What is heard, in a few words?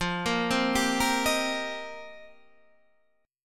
F 7th Suspended 2nd Suspended 4th, Guitar Standard; F7sus2sus4; arpeggiated; JGuitar